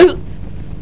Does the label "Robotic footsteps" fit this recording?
No